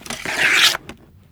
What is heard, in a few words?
Arrow Spawn; SteamVR; InteractionSystem; Longbow